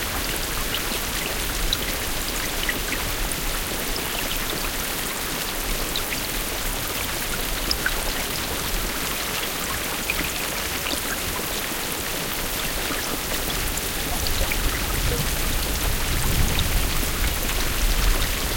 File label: rain heavy